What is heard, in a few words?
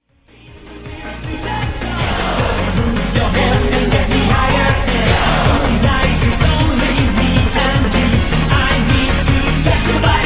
eurobeat